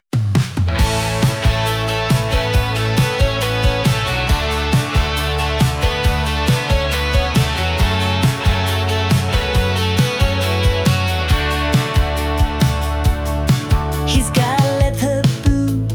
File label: jangle pop